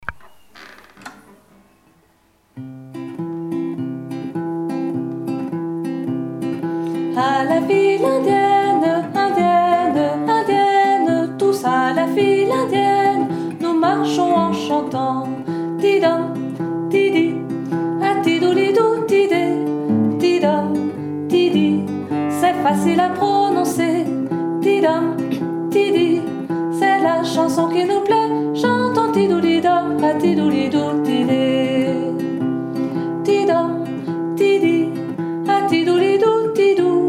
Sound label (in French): A la file indienne chantée